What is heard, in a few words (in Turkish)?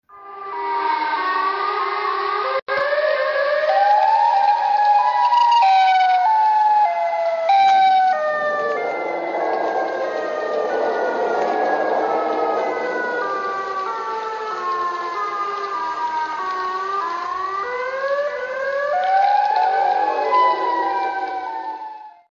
siren; kafa sesi